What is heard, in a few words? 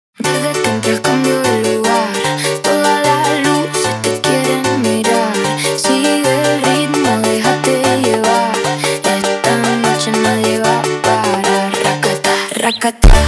funk